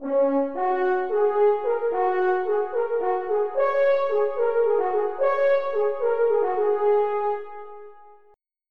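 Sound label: french horn; Roland - An Invitation To The Roland World Of Music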